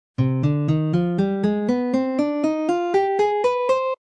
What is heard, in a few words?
c harmonic minor scale pattern, guitar